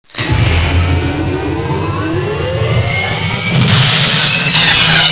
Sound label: psychomotor startup; ambience